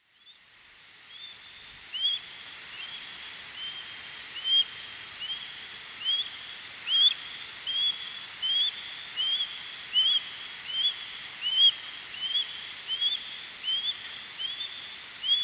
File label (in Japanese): sorisei; makabe